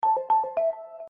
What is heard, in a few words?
alert ringtone